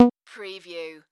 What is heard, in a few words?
Multimedia Beep